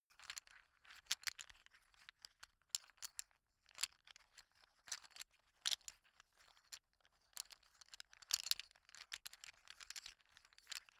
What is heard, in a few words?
poker chips handle sequence soft